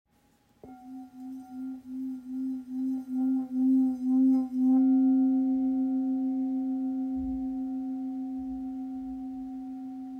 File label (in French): Bol do 840g